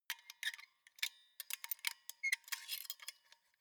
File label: stapler open staple compartment